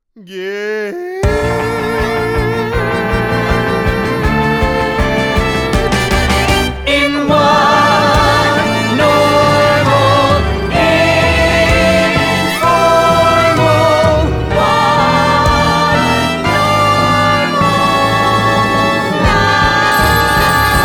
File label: guide vocal